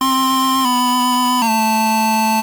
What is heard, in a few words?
sting, Arcade; civ13; civ14; Machines